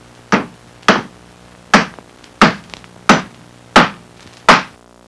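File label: hammering; HAUSHALT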